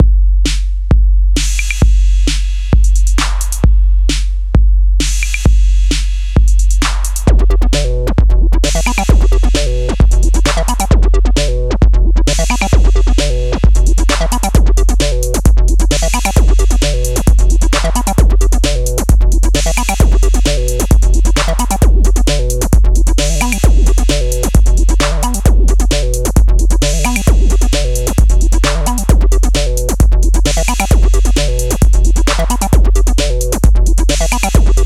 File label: 808 simulator